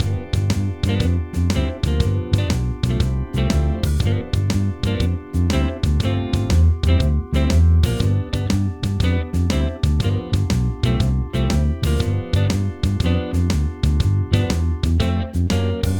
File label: G Mixolydian Vamp